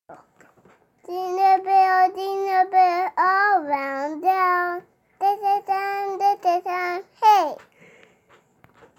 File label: Jingle bells baby